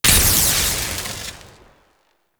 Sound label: Chain Lightning; Warframe; Volt